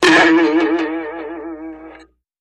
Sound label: Boing Box Sharp Vib